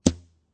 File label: shutter closing